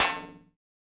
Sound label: E-Perc Metal, Electronic Percussion; Drums; Ableton Live 12 Lite; Core Library